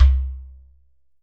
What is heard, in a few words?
GHATAM